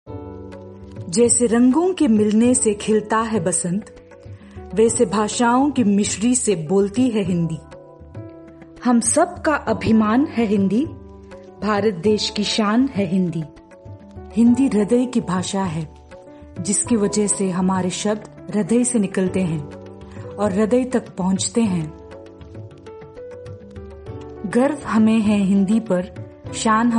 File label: Hindi Diwas Ringtone